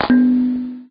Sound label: rock hit; There; ThereClient